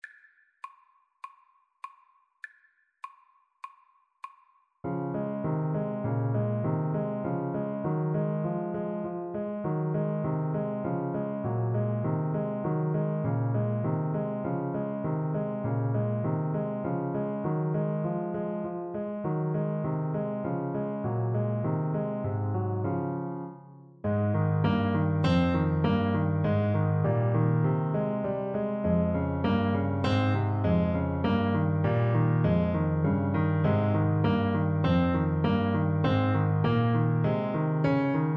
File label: Saxophone